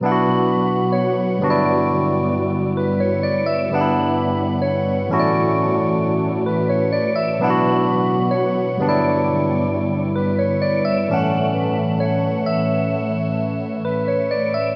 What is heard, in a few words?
Sad Melody Loop - 130 BPM B Min; Cymatics; Oracle Sample Pack